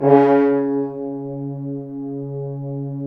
BRS, FHns Accent; Roland L-CDX-03 Disk 2

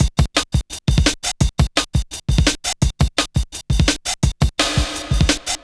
Kick Break, Drum & Bass; Fluid Dynamics

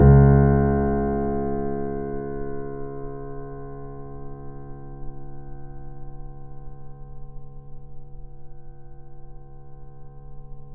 PP C#1 off, Grand Piano off mic; AKAI S6000 CD-ROM - Volume 2

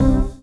HOUSE, House Dance; 90 s Sample CDs; Best Service Dance Mega

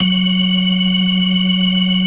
FAST VIB, TREMELO+VIB, Synth and Organ

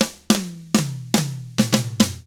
SMBA, SAMBA; ILIO; Double Platinum Drums 1

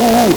LSDj Acid, Nintendo Gameboy; 300 Drum Machines

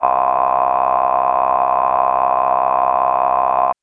Synth VoiceAh c1; TrashLibrary

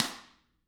Drums, SNR; CAMCO

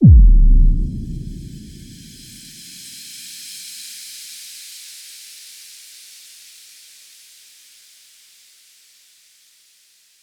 Boom; Zero-G - Future Wave; Drums; Xtra

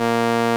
SOLINA, String Machines

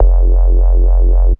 Get Down Bass

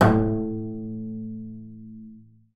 BING A2, BASS FINGER; Xsample VOL-5

